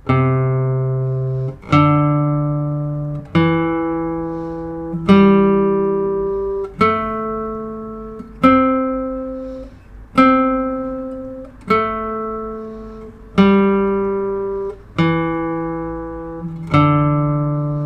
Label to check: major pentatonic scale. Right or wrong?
Right